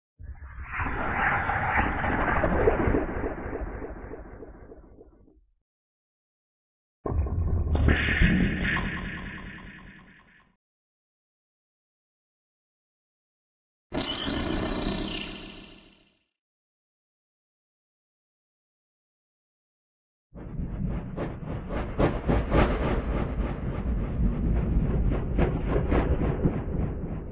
scifi mechanics set

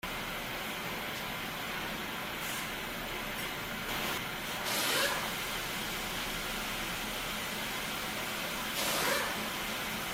urban auto repair garage

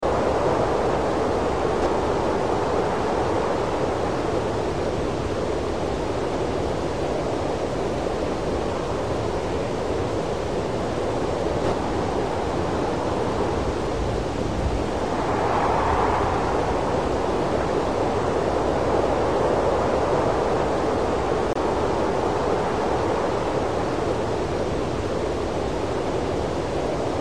holidays snow and wind

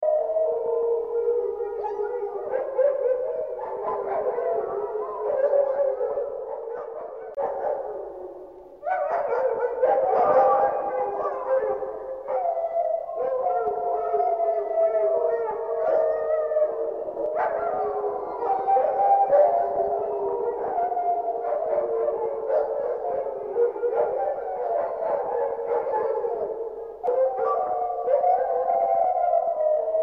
atmo dark distant dog howls

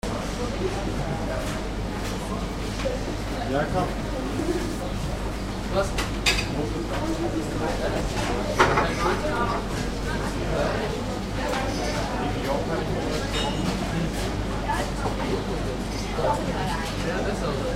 cafe; germany berlin cafe am neuen see

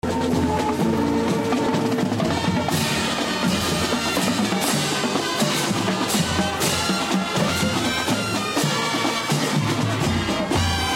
holidays parade band

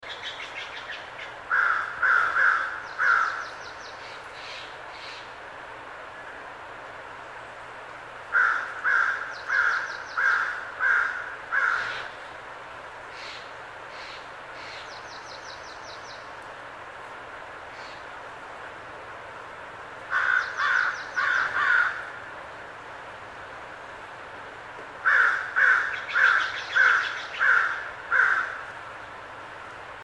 forests birds crow calls

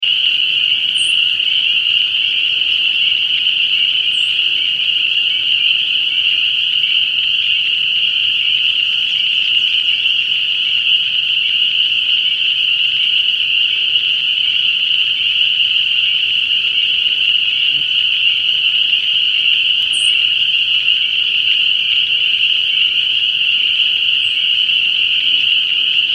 night peepers

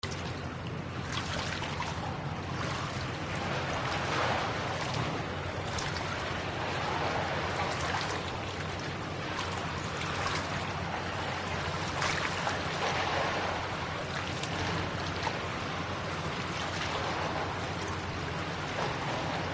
harbor water lapping nearby rocks

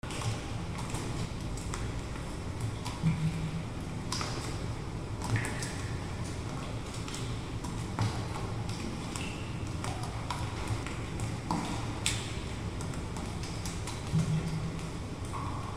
underworld grotto

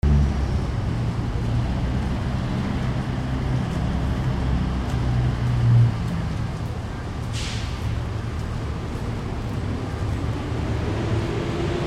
atmo arizona prescott town square traffic

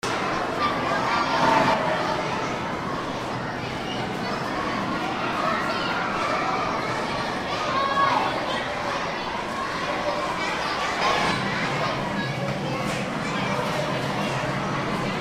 atmo kids discovery museum

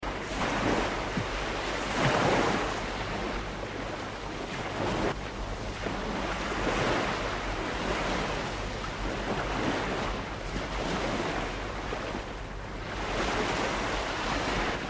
waves quick swelling surf

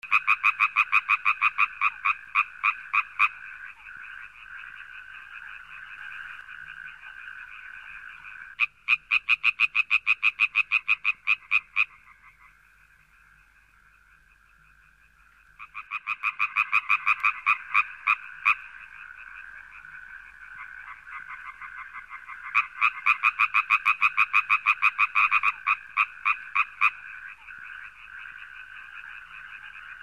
frogs green frog

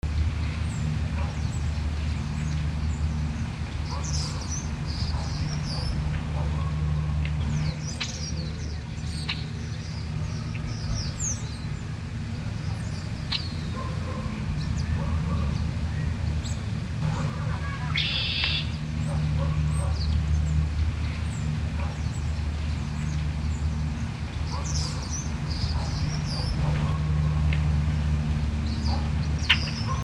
urban city birds and dog barking

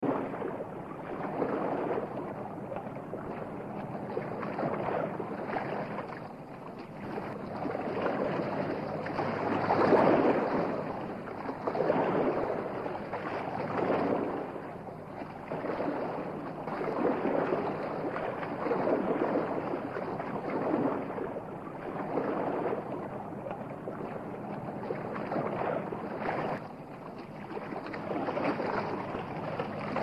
waves close and small

